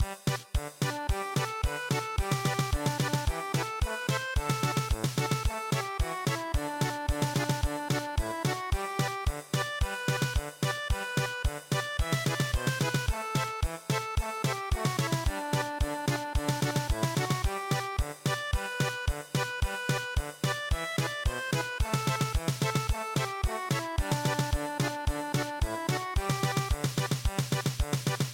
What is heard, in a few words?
German Polka